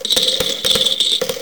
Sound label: chips; Game Zone; ANIMATED CRAPS